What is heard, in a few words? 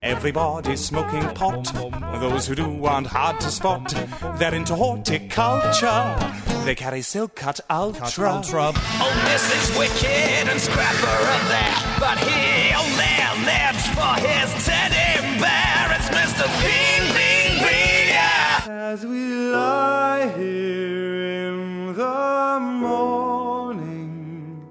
singing